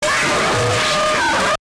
jumpscare sound

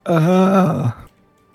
shyaahhh

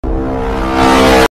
jumpscare; microsoft sam

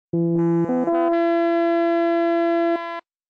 the horn; dragon view; snes ost

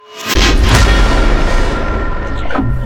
thud scare

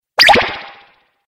sound alert

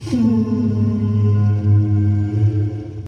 conch

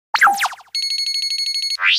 cards

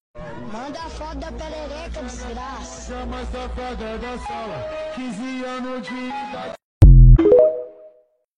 oun oun funk

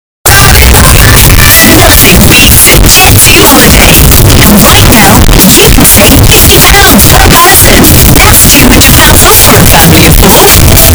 bass boosted

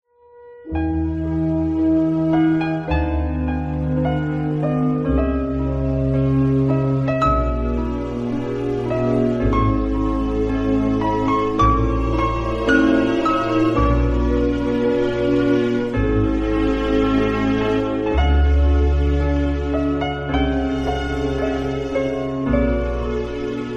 romance

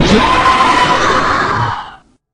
monster yell

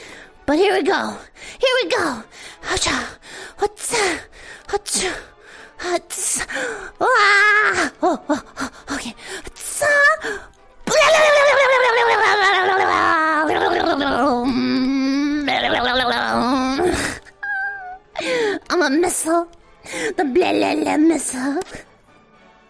missile; blelele

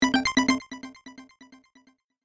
collect toppin; pizza tower

